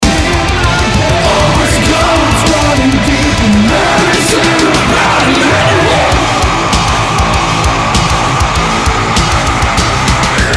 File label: bridge without screams